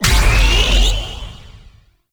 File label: Blitz Charge Up; Warframe; Eximus